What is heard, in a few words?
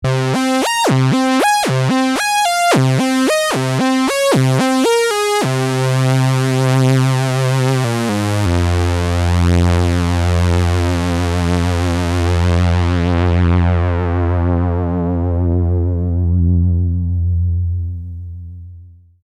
lead